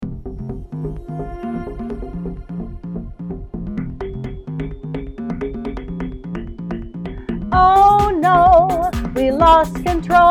vocals

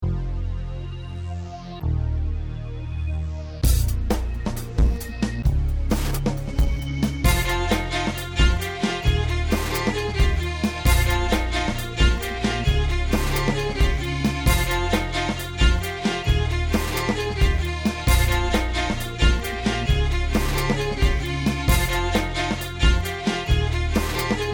instrumental

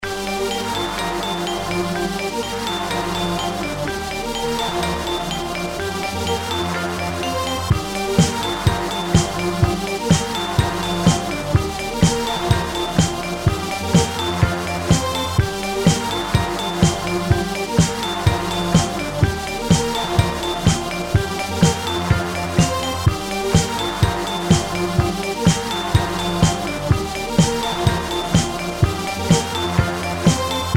instrumental

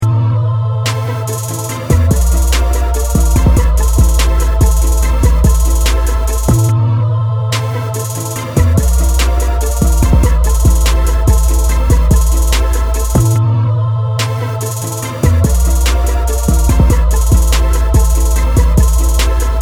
instrumental

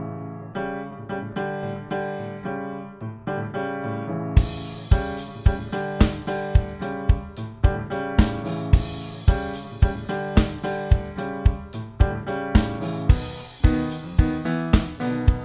Snow instrumental

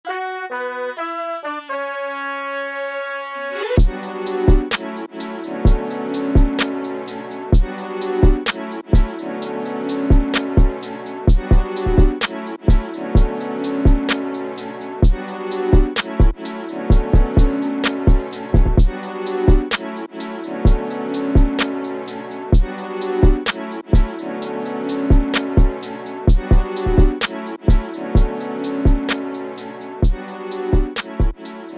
instrumental

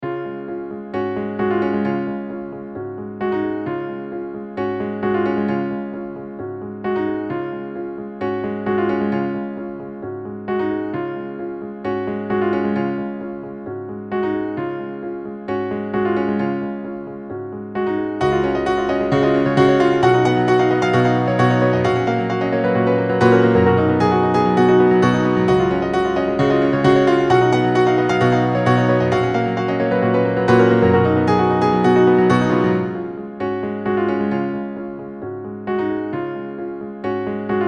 song instrumental

